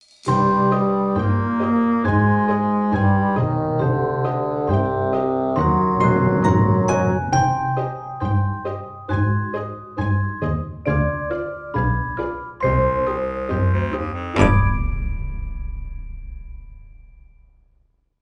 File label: Sitar; simpsons hit run; ps2